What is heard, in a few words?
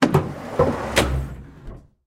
cockpit door open